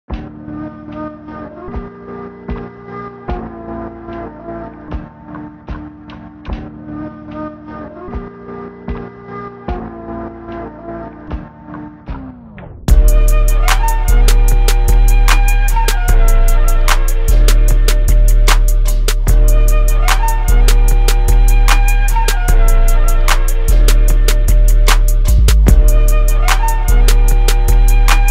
instrumental

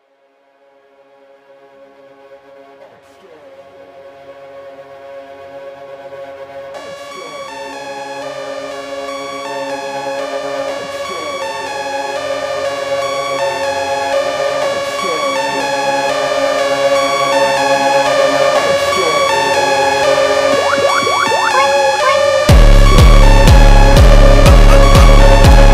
phonk song

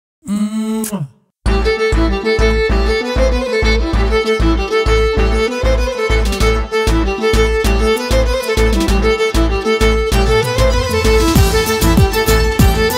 violin cover

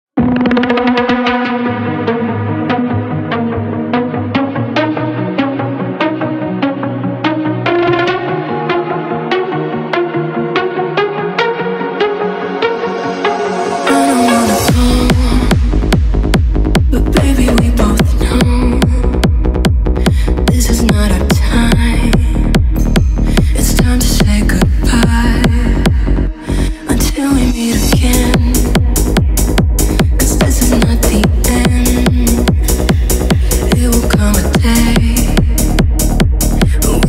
techno remix

